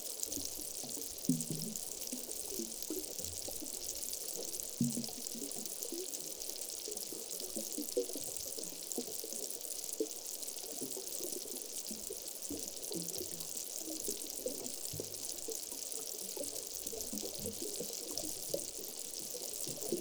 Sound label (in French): Hydrophone Fond Marin Hare Fjord